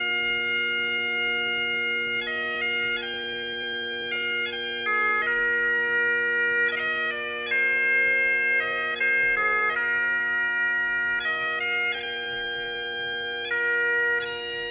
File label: Snare Drones